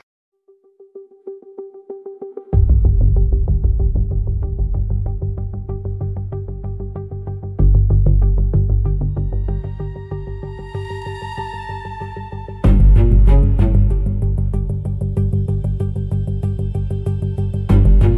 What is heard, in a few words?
high tension suspense for confrontation; puzzle solving and tracking